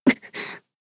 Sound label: Battle Damage; Atsuko